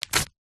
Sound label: paper rip thin magazine page; zapsplat